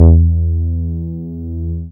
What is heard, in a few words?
BAS MINI, Mini-Moog